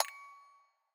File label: switch activate; cross-code; puzzle